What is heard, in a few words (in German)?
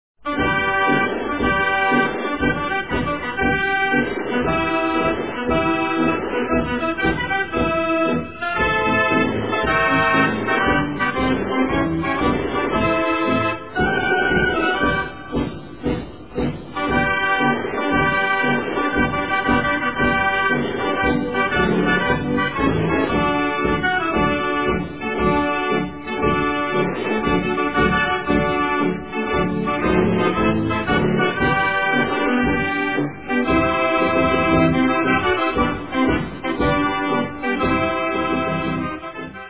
marsch, orgelmusikanten; badenweiler